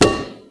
iron; Mafie 1

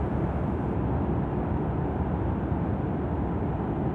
ambi in; Mafie 1

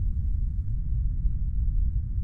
room; Mafie 1

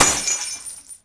glass; Mafie 1

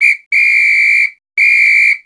fife; Mafie 1

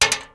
metal; Mafie 1

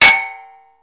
metal; Mafie 1

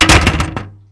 metal; Mafie 1

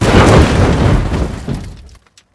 crash; Mafie 1

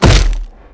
body; Mafie 1

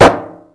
metal; Mafie 1

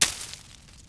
bush; Mafie 1

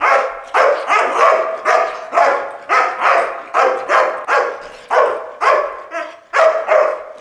dog; Mafie 1

